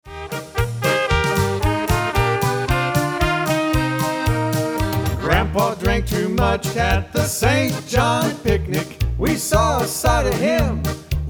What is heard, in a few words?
picnic polka sample